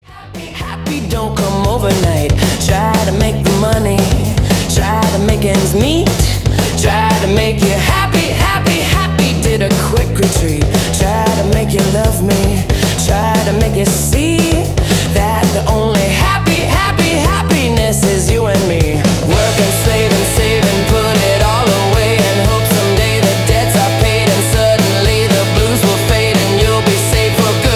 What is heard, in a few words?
Happy New Wave Pop Punk; Humain Records